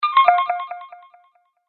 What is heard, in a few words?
multimedia rollover, Multimedia Rollover Tone; Tones; Sound Design; Sound Effects:Sound Design; McKinney Sound